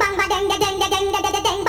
VOC

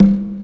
CONGA; E-Lab; X Static Goldmine 2

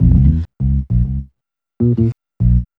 B-LOOP

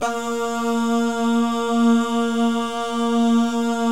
BAH GUY, Jazz

